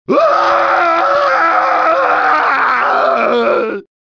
crashed scream; deception; sub-zero

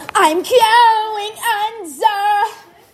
scream

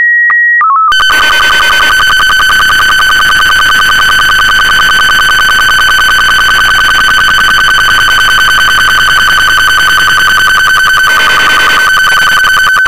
sstv robot 36

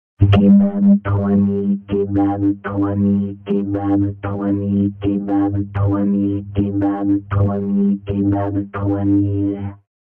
kebab robot

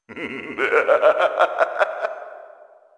evil laugh

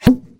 foomp

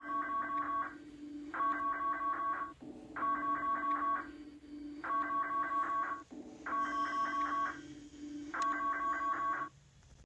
eas sound; spains

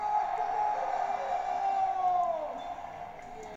punchline

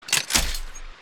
trap sfx

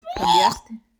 puahhh

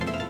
confirm; bangdream

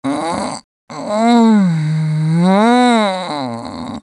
uhhh again aaa

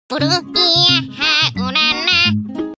singing; usagi

